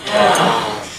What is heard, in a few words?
crowd aah!